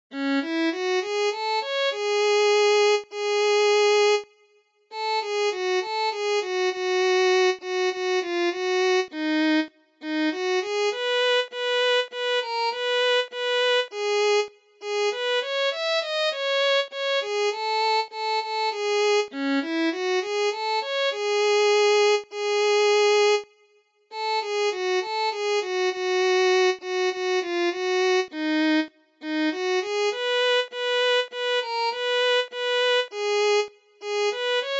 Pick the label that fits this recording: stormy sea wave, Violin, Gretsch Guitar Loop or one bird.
Violin